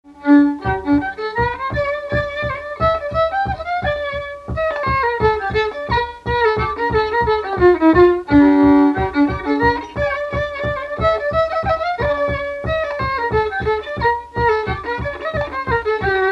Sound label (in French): Mazurka; Mazurka de Renou